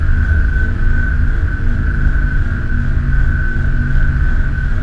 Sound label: phant near